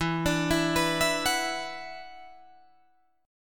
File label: E 7th Suspended 2nd, Guitar, Drop D; chord shape